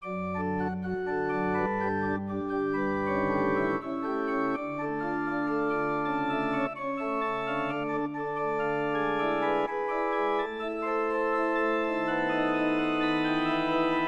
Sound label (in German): Orgelkonzert